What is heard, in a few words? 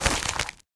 book open; video games; player; taunt; luxury lounge; source engine; tf